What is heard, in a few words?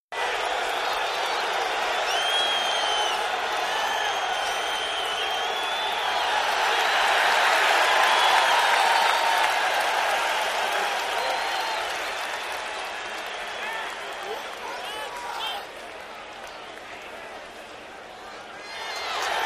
Football Crowd Rowd, sports arena football